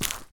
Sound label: step, dried ghast